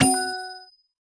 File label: equip face; Ringling Bros. and Barnum & Bailey; Wii